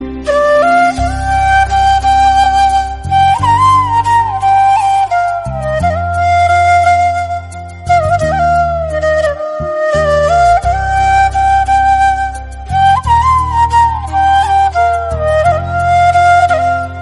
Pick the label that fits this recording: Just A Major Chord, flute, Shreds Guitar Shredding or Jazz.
flute